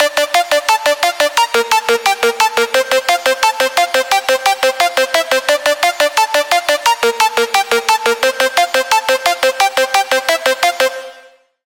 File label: Lead; Routed Sound; Sylenth1 Expansion - Flame Summoner Vol.1